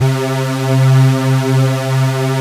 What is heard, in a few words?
OB BIG P; Synclavier Sampler Library